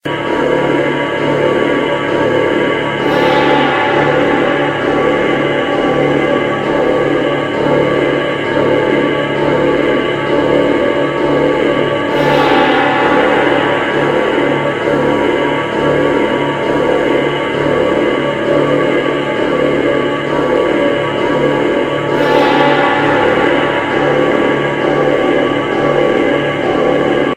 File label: Chase Music; Mom Of Sledrina